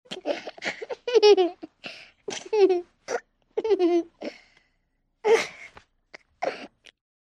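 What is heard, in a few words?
laugh of a slightly hoarse child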